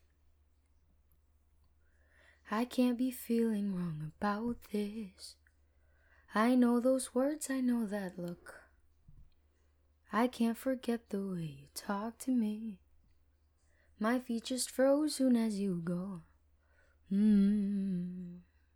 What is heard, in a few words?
main vocal